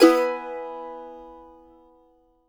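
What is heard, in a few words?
mandolin major, Country; Sampleheads; Whole Lotta Country 1 & 2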